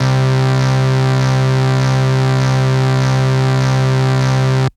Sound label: MS20 Fat Vinyl Synths C2, bass